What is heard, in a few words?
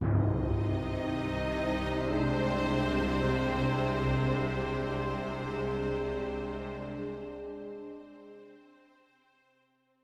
Music Segments Walk Big Cold 64bpm End; asterix obelix slap them all 2